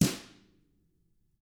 TAMA drums; ILIO; Double Platinum Drums 1

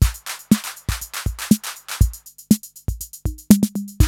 CR-8000 LOOP, DRUM LOOPS; Syntec; Wall of Sounds; TECHNO-BOX

